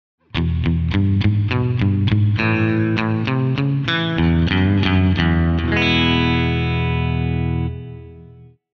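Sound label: guitar Reverb hall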